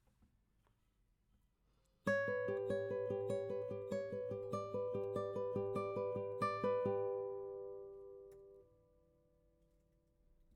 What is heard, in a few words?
GTR